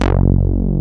SLAM F#1; Best Service Alpha Dance vol.2; SLAM SEQ.2

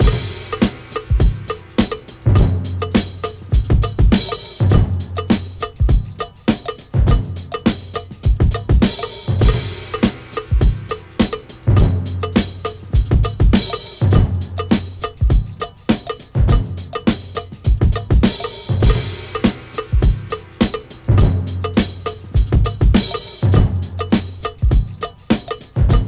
102 Perky Kettle, Breaks; Lockdown Breaks

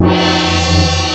GONG; CYMBALS2

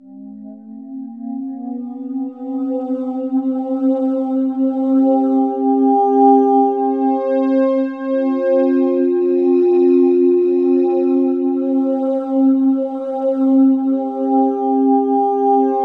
Pad; Dream Zone

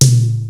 SPD-8 KIT

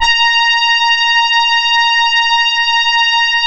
A#, Synclav FM, BRASS; Big Fish Audio

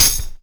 TAMBOUR K, Ragga & Dub Reggae

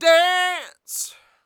DANCE; Spectrasonics Vocal Planet

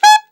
ALTSAX D3; Best Service; Alpine Volksmusik

